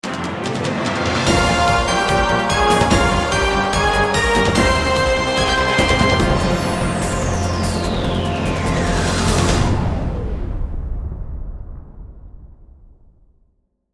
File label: Opening Titles without sfx; USA Clock Format; Millionaire Soundtrack; US Clock (2008)